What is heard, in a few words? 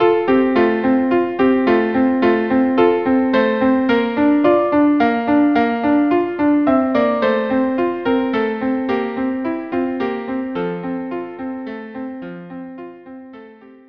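violin viola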